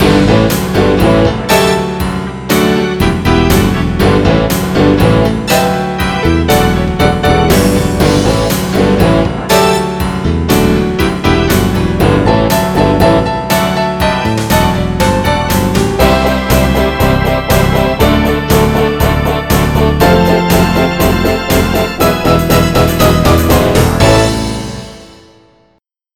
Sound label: rock; MIDI Music File